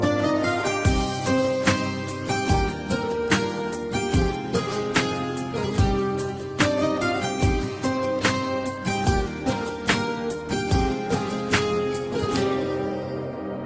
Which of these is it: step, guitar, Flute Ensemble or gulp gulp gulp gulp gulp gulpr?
guitar